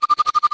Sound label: collide; fractalfun